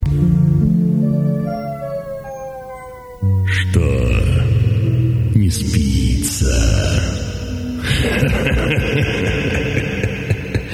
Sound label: night jingle